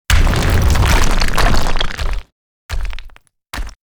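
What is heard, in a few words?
protect tower death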